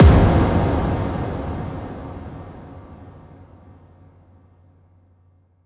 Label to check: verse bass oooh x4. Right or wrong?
Wrong